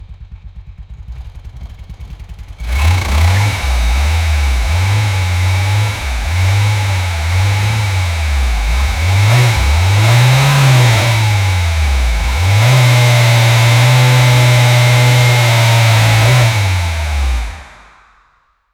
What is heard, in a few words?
12l duratec motor